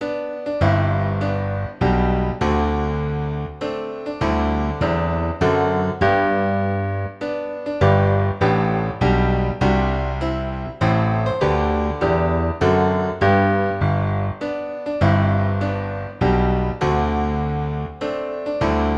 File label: Happy Birthday Slow Instrumental